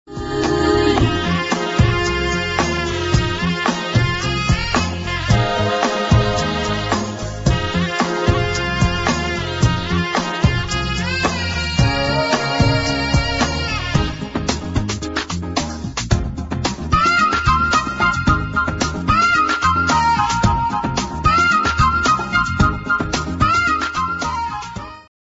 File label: shehnai modern